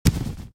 armor equip

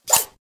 attack amazon

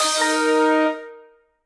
loot regular

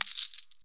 armor sell

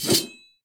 attack barbarian

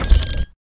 chest open

